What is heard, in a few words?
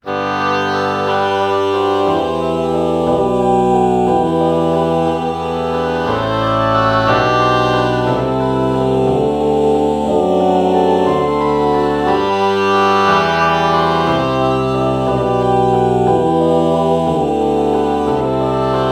oh oboe lpc false bach morph